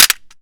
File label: Pistol Collision; zps; Foley